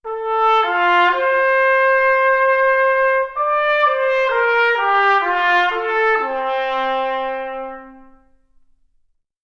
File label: trumpet; OmniPower4296